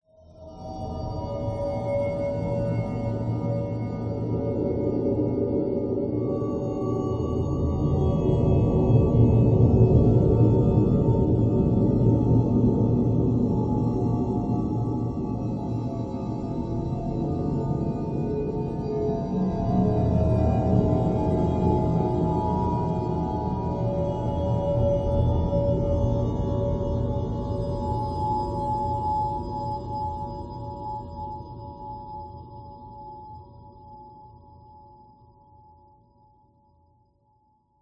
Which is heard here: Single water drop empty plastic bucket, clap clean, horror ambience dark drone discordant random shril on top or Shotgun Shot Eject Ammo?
horror ambience dark drone discordant random shril on top